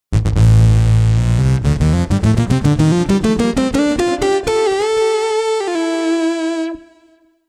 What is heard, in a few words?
Taurus; TURBO VL